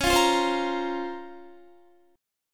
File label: Db Major 13th, Guitar; strummed; JGuitar